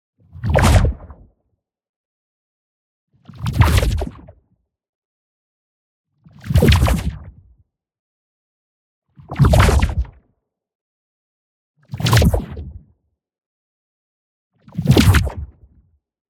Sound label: WHOOSH LARGE Ripping Ray; boom library; Matter